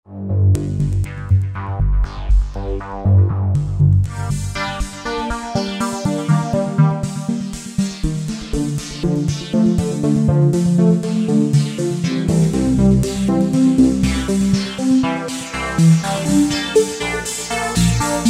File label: S&H Pad, Roland JV-2080